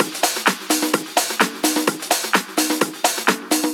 House Loop, 128 BPM; Vengeance Samples

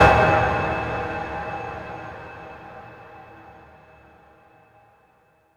Hits & Explode

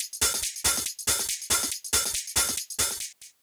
Loop 140BPM, Drums & Perc; Gminor; Vengeance Samples; VTS1 Devastating Truth Kit

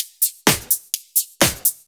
Electro Loop 128BPM; Vengeance Samples

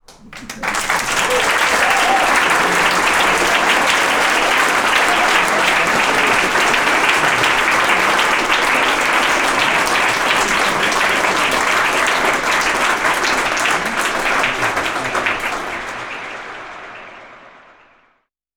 Ambiences, Places and Ambience; Vengeance Samples; FX Vocals

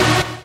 Synths D#; Vengeance Samples

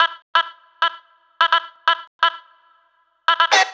House Loops, Vocal Loops House 128BPM

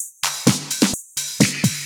House loops 128BPM; Vengeance Samples

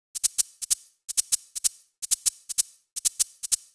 Shaker Loop, 128 BPM; Vengeance Samples

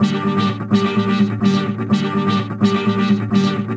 Special Sounds Root G 130 BPM; vengeance essential club sounds-3

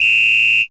Percussive FX; Vengeance Samples; VEH1 Percussion

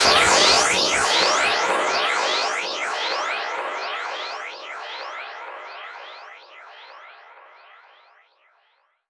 FX Downlifter

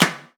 Clap; Vengeance Samples

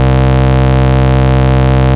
Ultimate Bass Root C; Vengeance Samples

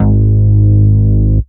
Bass, Root G#; Vengeance Samples

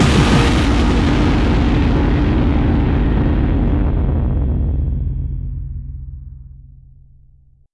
Bassdrums FX, FX Kicks; Vengeance Samples

